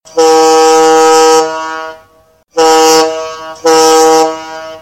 fog One prolonged followed by two short blasts

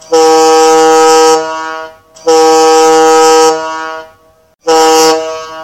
Two Prolonged Blasts Followed By One Short Blast

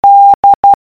D morse code

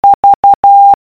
V morse code

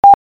E morse code

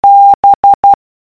B morse code

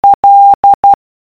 L morse code

